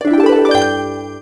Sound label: Sfx Win